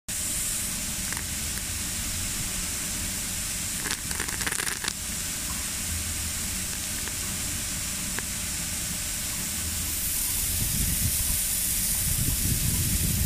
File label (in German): Getreide rieseln; Modulkoffer